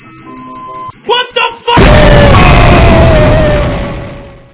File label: wtf boom; garrysmod